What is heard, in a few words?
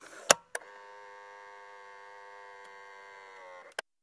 Door Inner Close